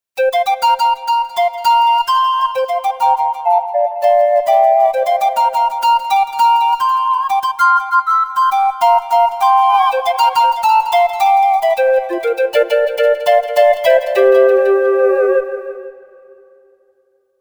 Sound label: FM Pan Flute; montage; flutes